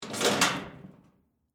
Trash Can Pick Up, Household, Trash, Can, Pick, Up, Metal, Garbage, Can